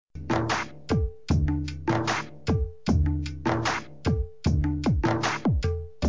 instrumental music tone

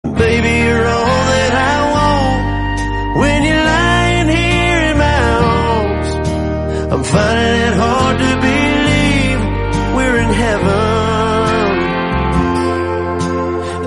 english song

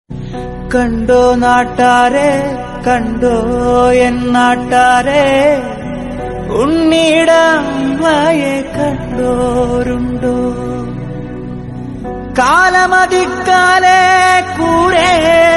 malayalam love song

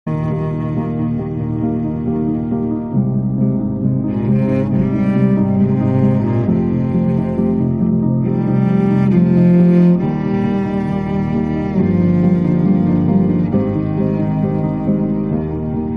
instrumental bgm

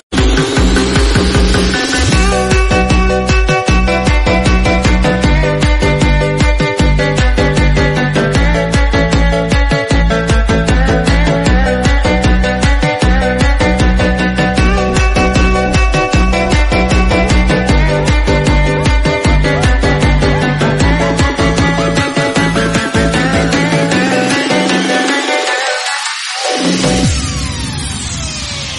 thailand style remix

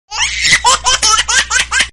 baby funny message sound

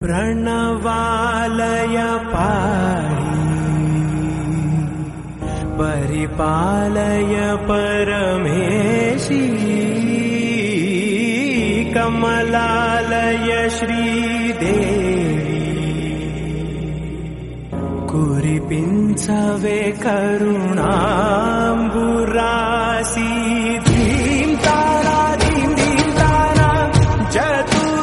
song telugu

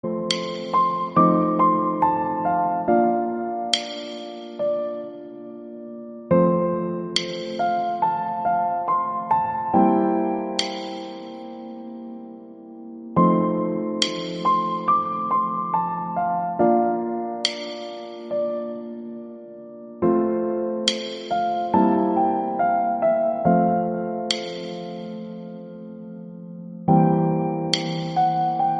piano instrumental

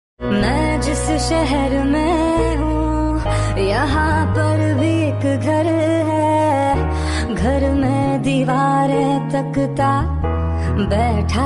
female version, hindi

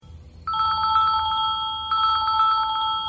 classic bell sms notification; samsung

